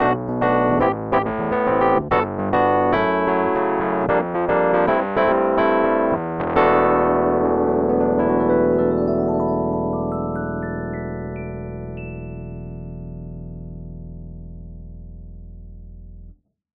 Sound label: Mono Test